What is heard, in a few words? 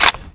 rifle reload